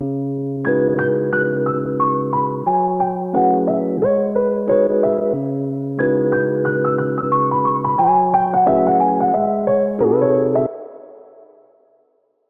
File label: Piano Wet - 90 BPM C# Min; Melodics; Cymatics - Eternity Sample Pack